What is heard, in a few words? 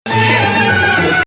Nawa Athar Gameel Rast